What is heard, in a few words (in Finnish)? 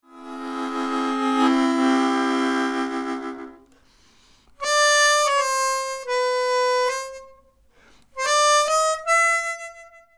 Näppäinhuuliharppu